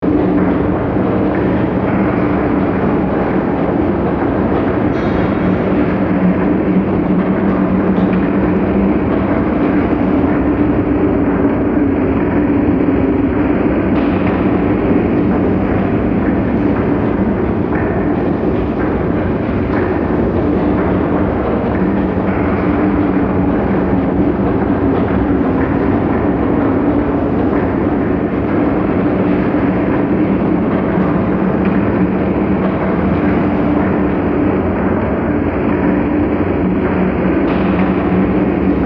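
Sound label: Ambience Repair Bay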